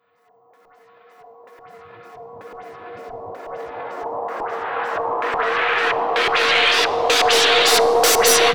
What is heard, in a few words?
FX Uplifter